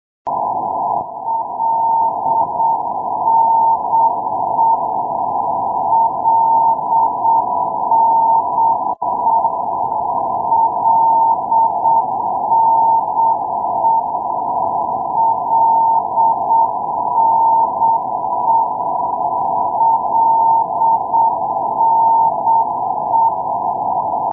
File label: ndb milano-linate lne; lago d idro